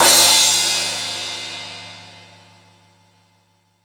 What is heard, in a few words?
Hip Hop, Drum Machines E-MU Pro-Cussion